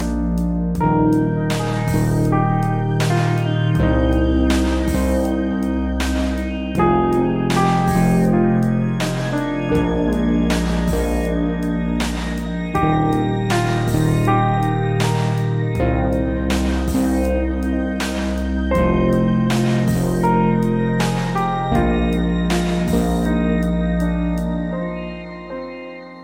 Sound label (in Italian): Ballad moderna